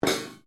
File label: METAL CRASH SMALL; Metal Sound Effects; Metal (Sound Effects:Materials)